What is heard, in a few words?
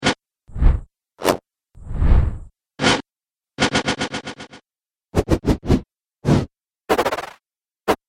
Swishes, whoosh